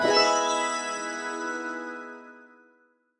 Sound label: firework twinkle